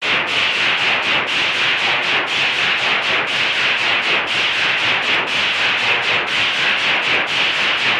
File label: steam engine